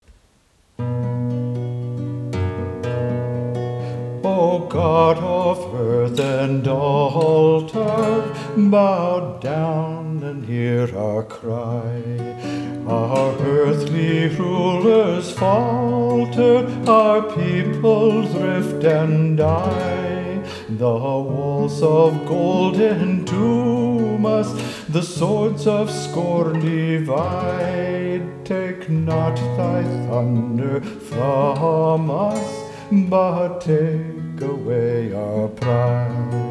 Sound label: hymn; O God of Earth and Altar